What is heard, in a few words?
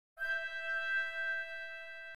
trumpet